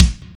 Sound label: kik, drums; virtual drum sounds; custom drums